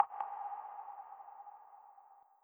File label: drop